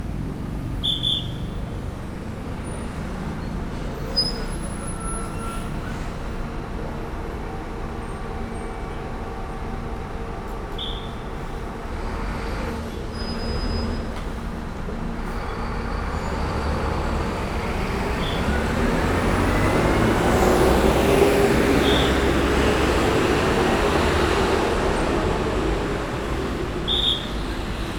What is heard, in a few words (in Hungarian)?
jarmuforgalom iranyitas sippal